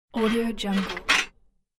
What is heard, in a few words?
metallic hinge move noise